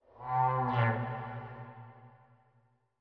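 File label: Animal Stinger Eerie; stingers & whooshes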